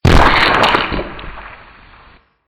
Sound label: loss; flappydick